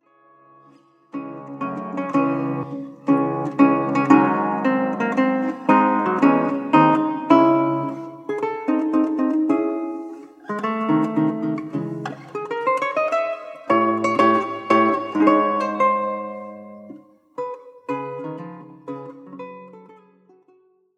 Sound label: Heptachorde Allegro; 1840 Lacote; Coste